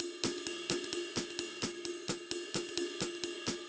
swing BPM 260

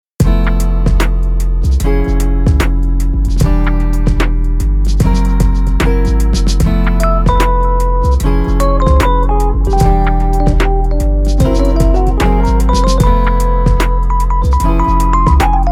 make scales hip hop

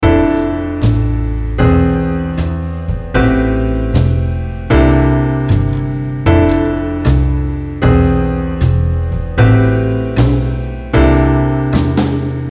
6th voicing

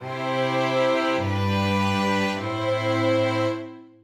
cadence deceptive

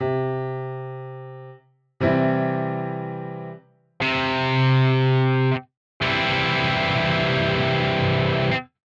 powerchord comparison to piano

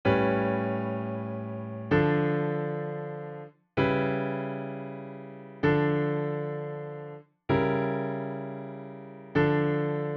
compare domi9 halfdim dim

